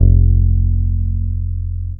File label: Synth oneshot Long F, Synth Bass One Shots; Slate Digital BASSHOLE Sample Pack